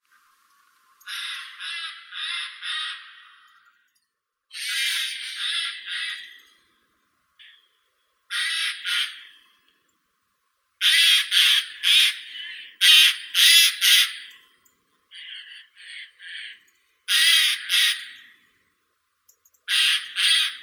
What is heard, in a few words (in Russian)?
zvuki prirodi soika garrulus glandarius